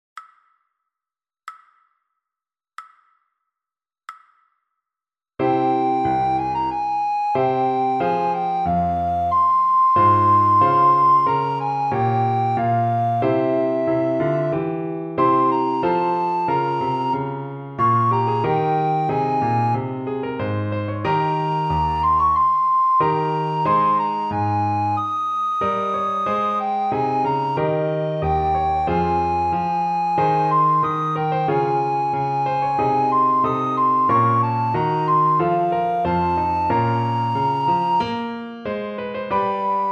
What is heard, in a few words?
recorder; Telemann